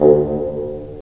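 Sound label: WND BN, wind bassoon; LA Composer vol. 3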